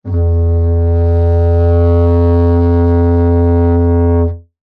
foley blowing into plastic pipe vibrate resonated didgeridoo like